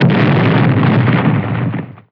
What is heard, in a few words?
explosion; vooga; tron